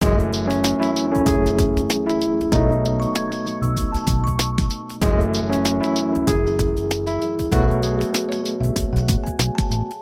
free spin melody; Lucky Zodiac

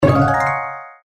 bonus; Fairy Dust

